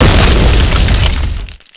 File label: earth falldown